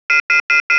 phone

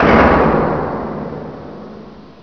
impact

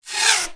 close

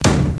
player disconnect

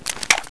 ppk draw

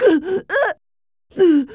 death

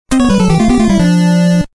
die

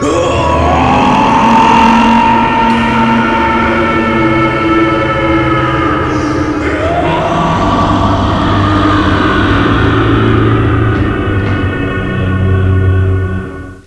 aaaaaaaaaaaa

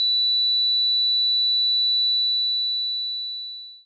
ear ringing

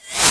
respawn

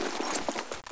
saw inspect, weapons

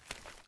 cloth

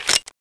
revolver cock

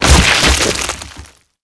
body splat

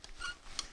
open, rpg

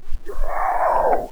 pyro pain

